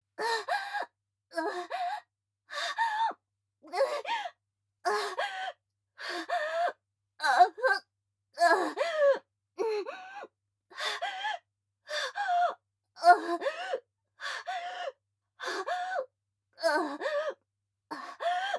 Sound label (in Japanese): アナルファック中・息遣い・ゆっくり; ヘレナにアナルファック中